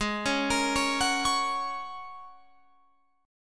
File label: Ab 7th Suspended 2nd Suspended 4th down Guitar Open F; arpeggiated